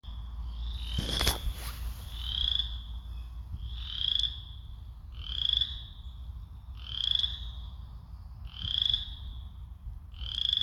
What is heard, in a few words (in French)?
chant rainette faux grillon